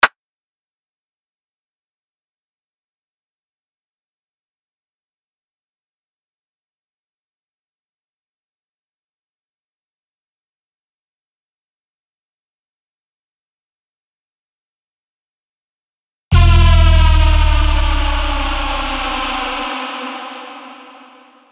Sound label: Perc